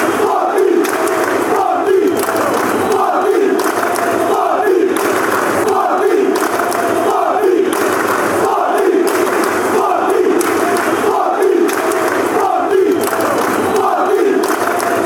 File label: clap clap clap, chants; sporting